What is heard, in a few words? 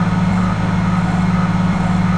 computer fan beeps